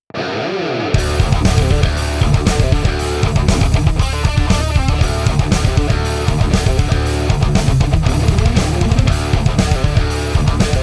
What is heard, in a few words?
DI REAMP